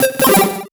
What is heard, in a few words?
Solo Chip Arp Positive; MUSIC EFFECT; startide